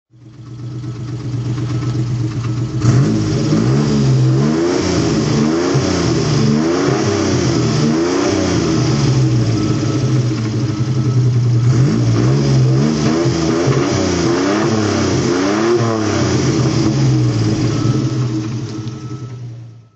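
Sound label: citroen sm throttle; Citroën SM, 1971; Engine sound; V3D/ ClassicarImages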